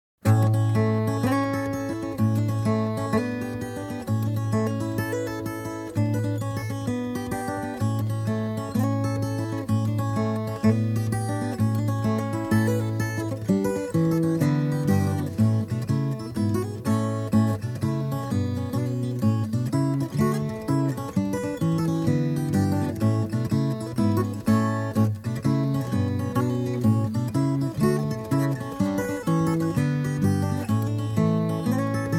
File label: Elsie Marley, Celtic Fingerstyle Guitar; Mel Bay's Complete Celtic Fingerstyle Guitar